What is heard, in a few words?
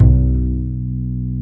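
double bass B2; Univers Sons; Jazzistic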